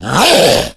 hulk pain; Left 4 Dead; Source Engine; tank

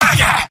sniper negative vocalization; tf; mvm

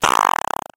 tractor beam; player

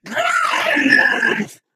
ballbot yikes

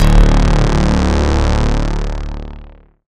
warning; source engine; tf; mvm

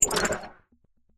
editor buttons; ui; Source Engine; Portal 2

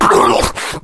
shoved, infected, rage

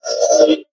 robot synth; Source Engine; Portal 2; robot parts

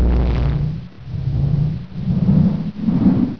fan on, fans; source engine; hl1; video games

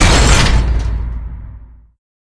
door close

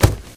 body medium impact soft; Source Engine; video game

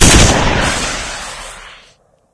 rocket, weapons; source engine; dod

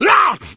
hunter shred, attack voice

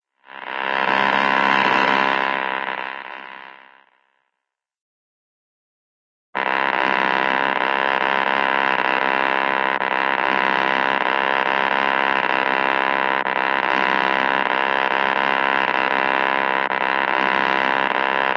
airplane, model model airplane pass by; cartoon

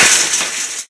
metal chainlink impact hard, physics metal; source engine; left 4 dead 2

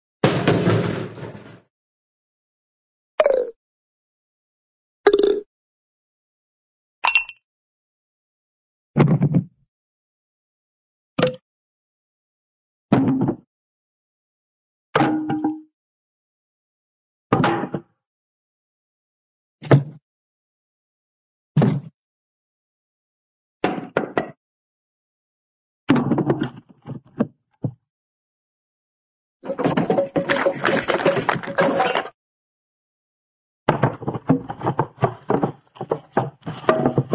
drop, can drop large metal garbage can; cartoon

